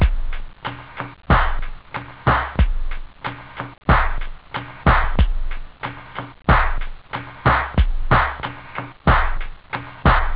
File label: hiphop, house; OctaMED SoundStudio V1